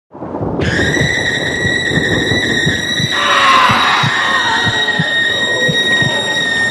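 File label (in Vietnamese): tiếng khóc đêm mưa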